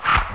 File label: sword; Curse of Dragor